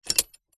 horse saddle horse stirrup iron metal foot in movement exaggerated; animals